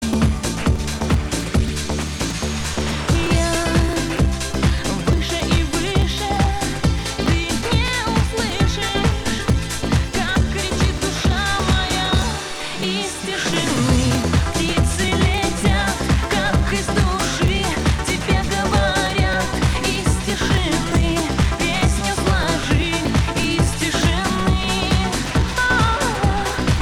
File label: Ringtone